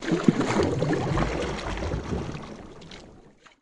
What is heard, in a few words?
Canoe Rowing Thru Wat; rowboat & canoe